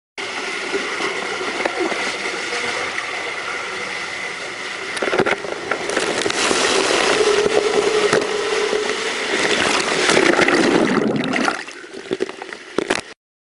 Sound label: Water Slide; Leap of Faith Atlantis